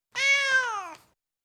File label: Tom Cat, Individual Meows; Cats; BBC Sound Effects Library